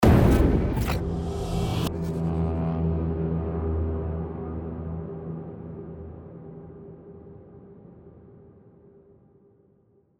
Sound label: Sound Design Mech Hits